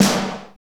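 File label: SNR snare, Techno Rock; Northstar; Drumscapes Roland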